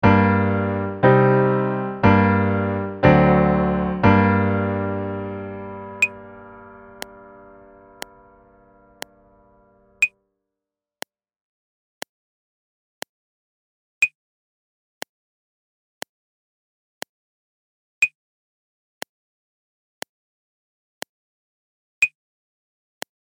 G Minor 44 8 Measures wo Piano

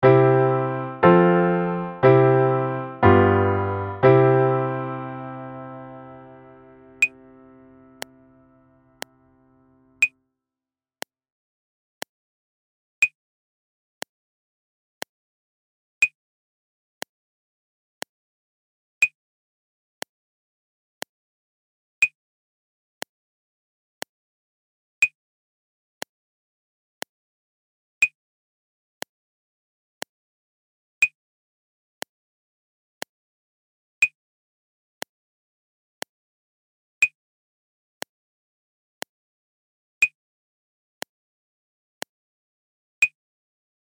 C Major 34 16 Measures wo Piano

